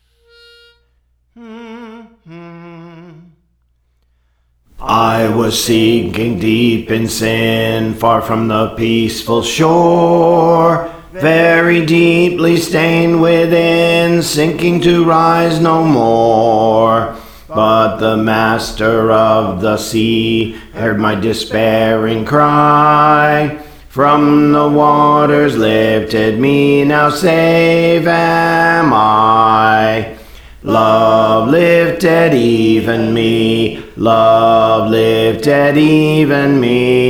Bass, Songs; Praise For The Lord Training; Love Lifted Me

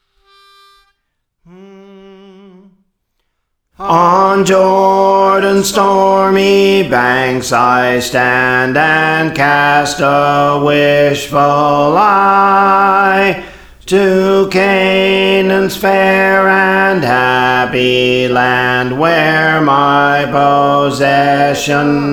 Bass, Praise For The Lord Training; On Jordans Stormy Banks (OKane)

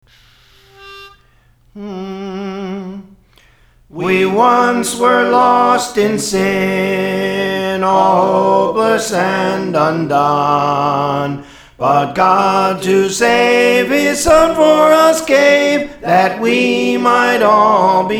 Soprano; Praise For The Lord Training

